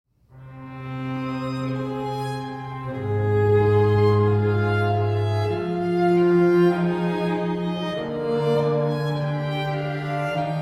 String Quartet Version

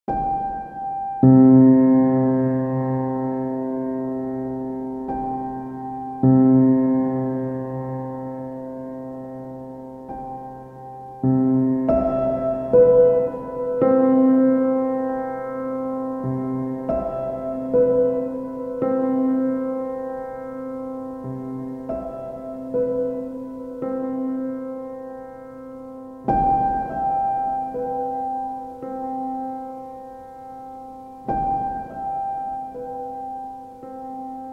Piano Sounds

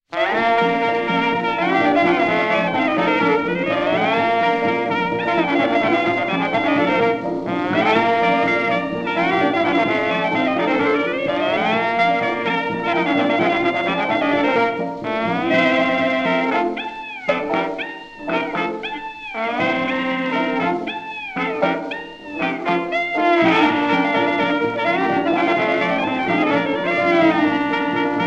Dixieland Jazz Band

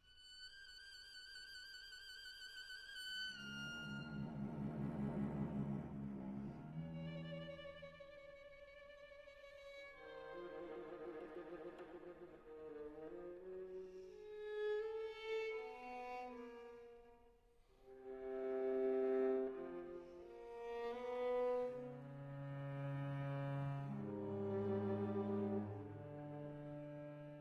String Quartet in B-Flat Adagio molto

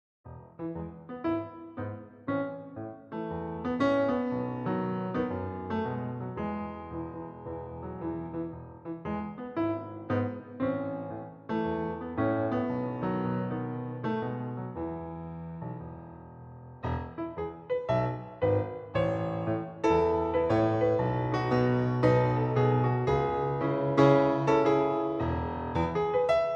Jazz Lounge

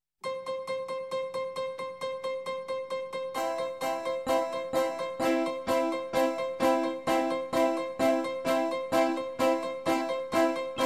Piano Circus In C; Riley

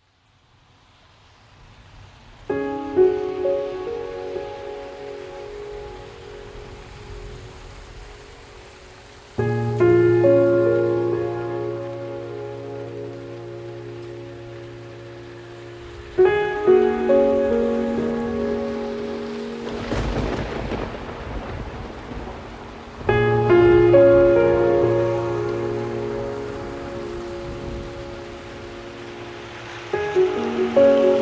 Piano and Rain

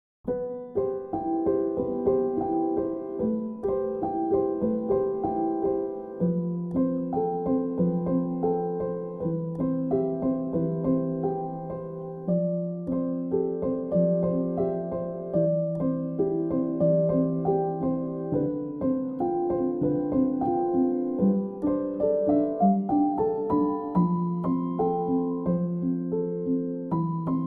Dreamy Piano

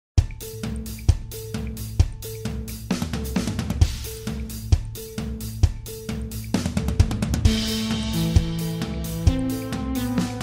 Futuristic Music

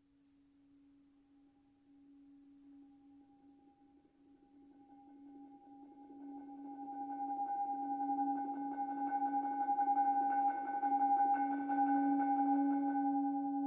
Dialectal Percussions

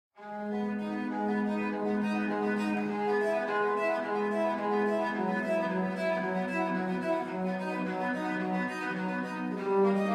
Viola da Gamba, Praeludium. Allegro; Essercizii per la Viola da Gamba No. 8

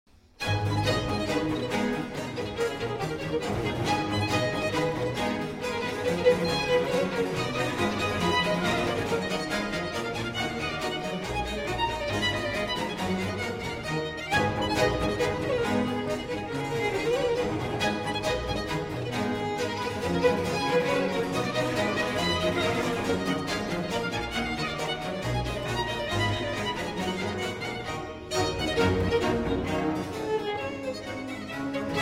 Overture in B Minor, Badinerie. Staccato; Overture No. 2; BWV 1067

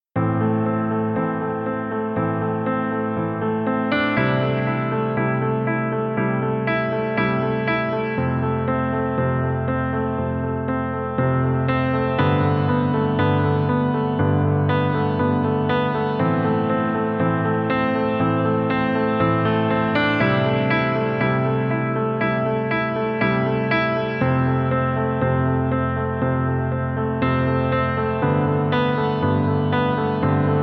Piano Only

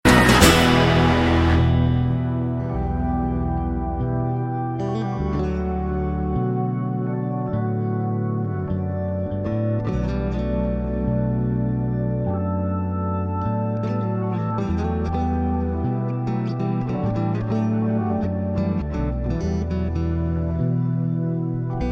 Ambient Guitars

